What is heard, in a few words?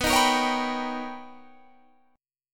B Augmented Major 9th B Guitar Standard, chord; B+M9; strummed; 19,18,20,18,20,19; JGuitar